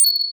Star Instruments Synare PS-1

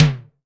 SIMMONS SD-5 drums

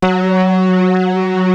DEEP

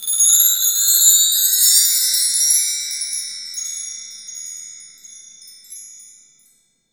mark tree, wind chime; AKAI S-Series CD-ROM Sound Library VOL-5

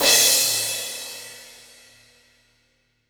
cymbal crash, rock crash

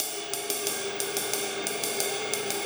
Cymbol Particle; Particles

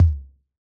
Tom, Yamaha DJX 2B

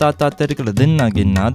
INDIA R, Heart of Asia, IND ENS

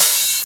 Open HH, Cymbals

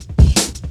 Funki Tuff Cut, Jungle, Drum Loops 166.5; Zero-G Jungle Warfare 1, 2 & 3

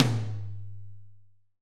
AMBTOM, ROOM AMB TOM

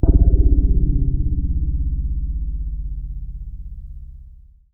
C1, Synth Line

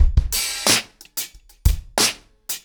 90BPM, ACOUSTIC DRUMS

